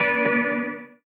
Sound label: G Maj Chord